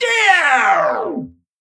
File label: Male Mechagnome Death